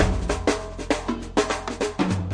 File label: chubb sub bass roller, drum loops and breaks, 098 bpm; 091 to 100 bpm